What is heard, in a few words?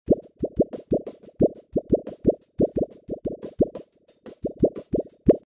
drums phase bubbles